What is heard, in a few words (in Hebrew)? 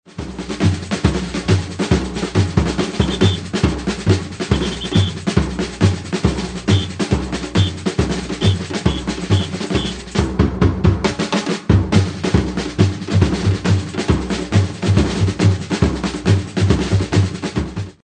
Break low, מעבר 4 נמוך; Batucada